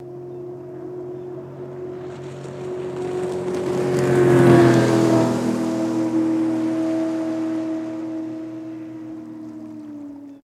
115 hp Evinrude Boat Pass By, Fast Mono

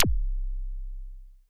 laser; lasers

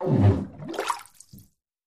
Bathtub Fart Tuba; farts

